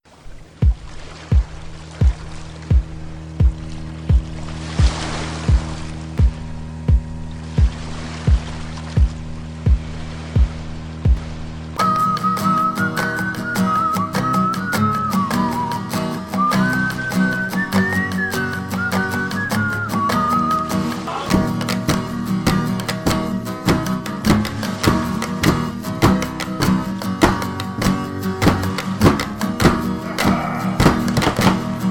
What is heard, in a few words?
a pirate shanty karaoke; opening